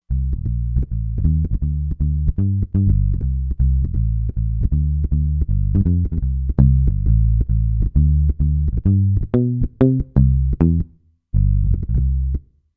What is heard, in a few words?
Comp Picker, Roland Fantom XR